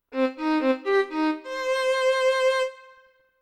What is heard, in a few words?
violin short